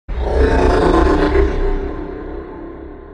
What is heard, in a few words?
scary; mass effect 3; reapers